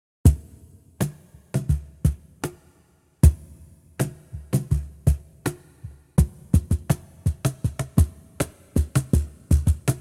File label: cajon snare